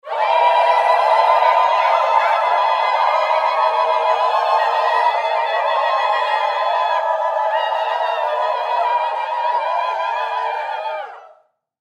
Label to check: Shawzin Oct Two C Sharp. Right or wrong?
Wrong